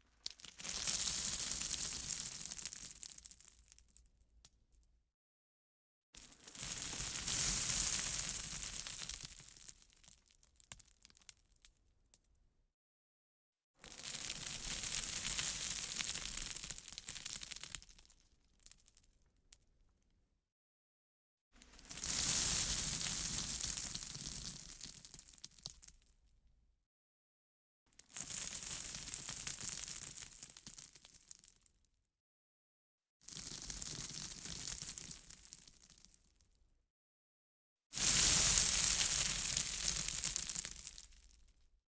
small crushed rock drop thick

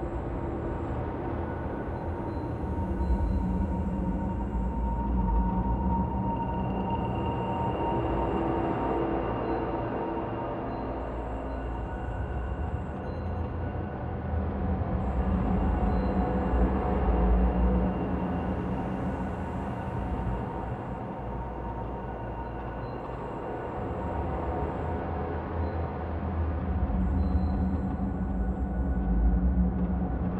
dark secrets loop, ambiences; Michael Ghelfi; RPG Ambiences Vol. 6 (Short loops)

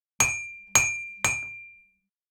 medieval smithy anvil hits tree hits; sonniss gdc

